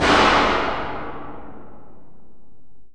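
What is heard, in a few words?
JA STEEL AMB, percussion metallic; Rarefaction; Poke in The Ear With A Sharp Stick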